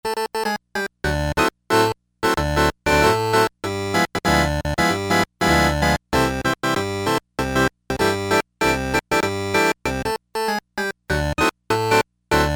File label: Organ 16' + 8' + 4' + 2', Crumar Trilogy